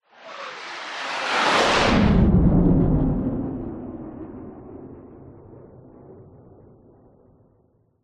vehicles aircraft fighter jet pass by fast designed